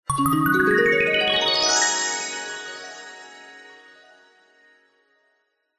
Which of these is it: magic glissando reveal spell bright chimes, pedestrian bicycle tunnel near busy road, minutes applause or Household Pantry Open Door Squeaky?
magic glissando reveal spell bright chimes